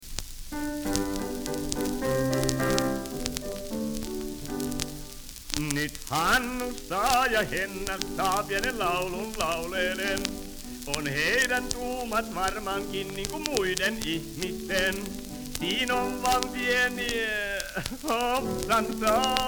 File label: Polyphon